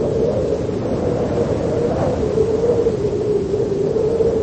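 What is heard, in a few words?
wind single distant noisy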